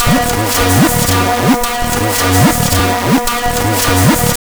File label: tabla, 110 bpm; Zero-G; Pure Tabla